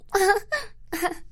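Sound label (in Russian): смешок девочки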